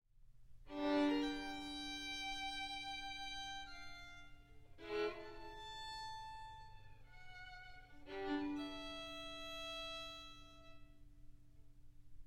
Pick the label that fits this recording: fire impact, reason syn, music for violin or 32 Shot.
music for violin